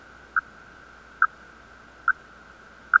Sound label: 28 MHz USB 500 Hz, noise